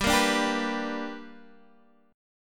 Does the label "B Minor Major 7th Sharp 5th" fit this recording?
Yes